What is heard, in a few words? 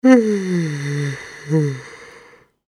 yawning